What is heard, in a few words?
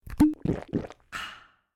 Drink Sound